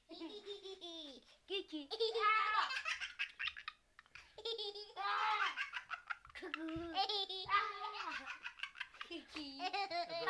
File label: children laughing